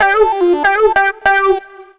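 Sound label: synth, roots funk; USB Soundscan vol.41 - Roots Funk; AKAI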